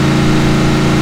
f150 rpm, Engine; Cars; XBOX

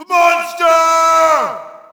Shout, audience; UFC Tapout 2

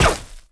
rico repeater Cannon

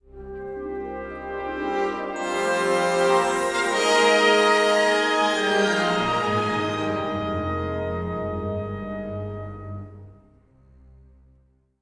music flourish

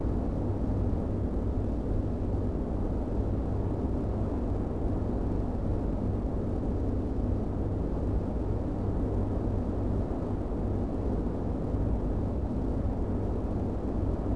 open area ambience; Star Wars Obi Wan; XBOX

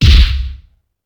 tackle; UFC Tapout

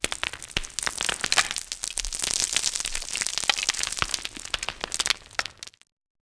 concrete wall cracking; Max Payne; tenements

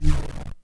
zap

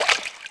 fountain splash; Tony Hawk's Project 8; XBOX; houses

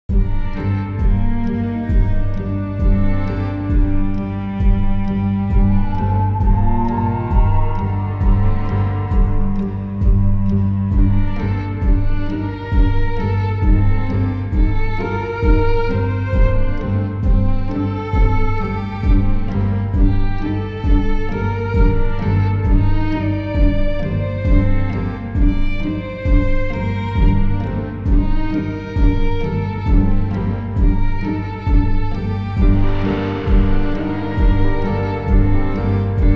Stealth LP; music; Temple; The Da Vinci Code; XBOX

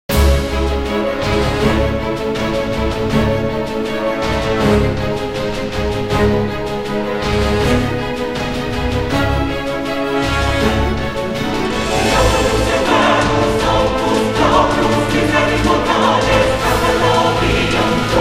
Fast Amb; music; Westminster; The Da Vinci Code; XBOX

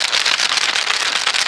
spiders

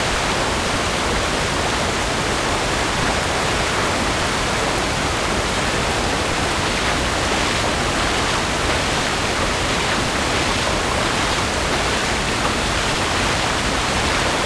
amb waterfall; Star Wars Knights Of The Old Republic II; XBOX

